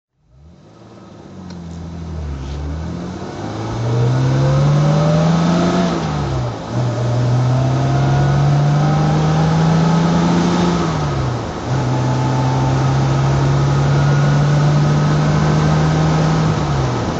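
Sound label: jaguar etype ots drive, engine sound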